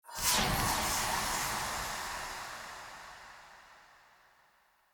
Helmet Carry Burst; Friendly; Tuvalkane; Discovery

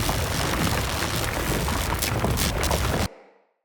Tesla Target Ground Loop; Encounters; Ode Pollution Tower

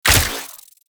Derelict Prime Shot; Infested; Cernos; Weapons

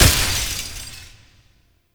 Devourer Crystal Damage A; Veilbreaker; Devourer Kahl

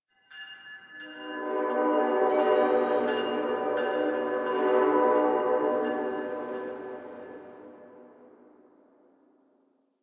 Distant Train E; Warframe; Ambience; NineteenNinetyNine

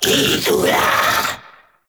summon vocal; Warframe; Uriel; Vythelas

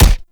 Alt Punch; weapons; Warframe; Fulmin Prime

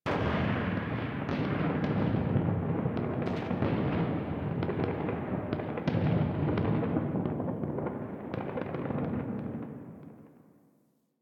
Ambient Distant Explosions; Nineteen Ninety Nine

